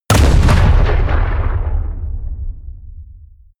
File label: explode close